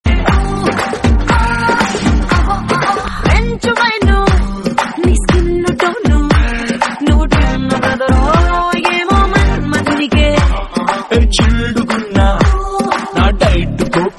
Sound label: Song Telugu